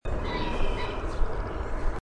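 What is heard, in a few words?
Crowing Call, Ring-necked Pheasant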